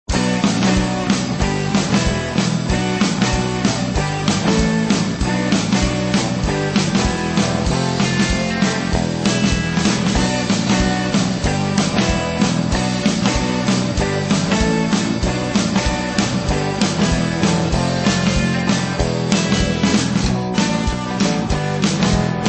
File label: hop (instrumental)